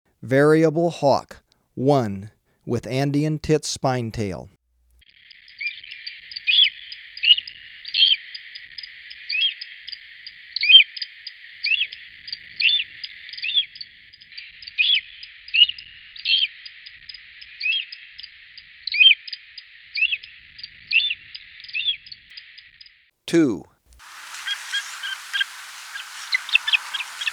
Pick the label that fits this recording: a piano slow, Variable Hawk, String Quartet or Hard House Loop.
Variable Hawk